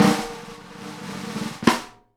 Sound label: snare ROLL HIT, Live Snares; E-MU Producer Series Vol. 8 – Platinum Phatt (CD 1)